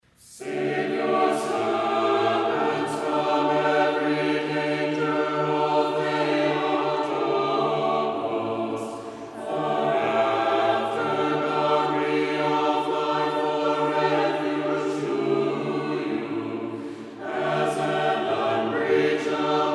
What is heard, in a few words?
Troparia Tone 2